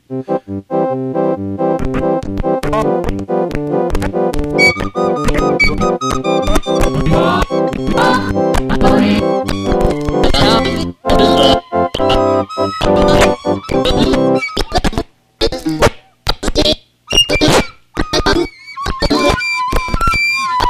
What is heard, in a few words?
circus ditty